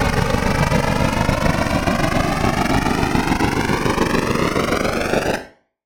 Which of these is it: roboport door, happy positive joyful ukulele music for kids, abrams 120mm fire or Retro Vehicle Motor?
Retro Vehicle Motor